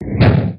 stamp

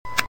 click

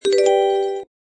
challenge win

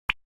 chain removed; game; guodongrenwu

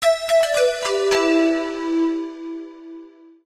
fail; tangguo

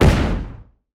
bomb

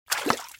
water

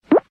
click

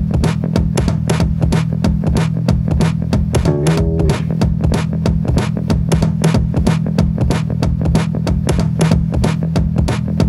menu loop

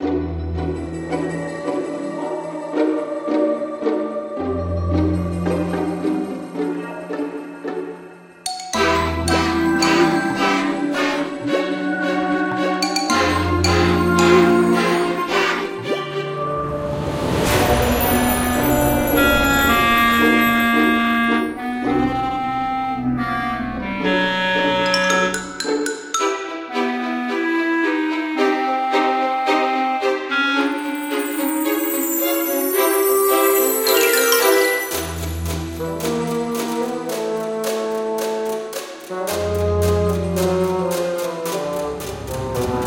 music

